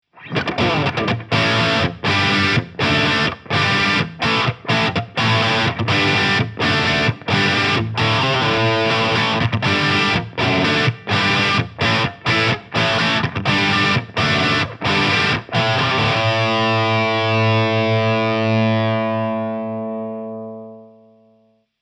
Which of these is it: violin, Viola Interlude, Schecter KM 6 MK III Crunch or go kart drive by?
Schecter KM 6 MK III Crunch